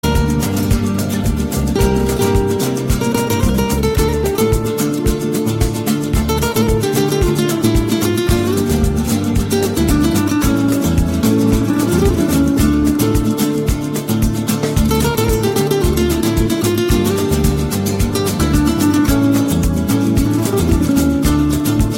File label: Best Guitar